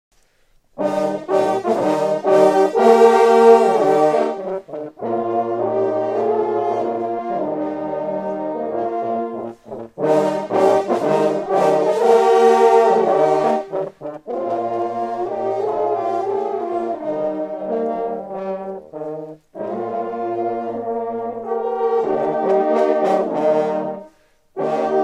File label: Marche Solennelle; Chalmel